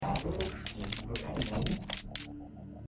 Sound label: bleed; risk of rain 2